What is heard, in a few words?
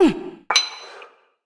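Inmate Female Benchpress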